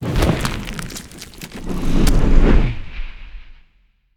Sand Hunter eats Dwarf; we are the dwarves gamerip 2016

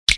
Finger snapping

